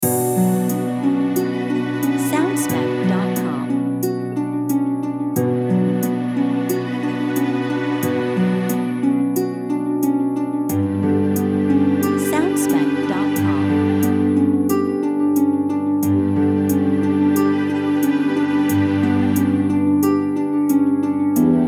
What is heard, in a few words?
Neutral But Mystical Main Loop